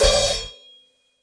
crash choke medium loud overloaded

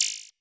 machine, Machine Electro Hits; STYLE KITS (Hip Hop, Etc)

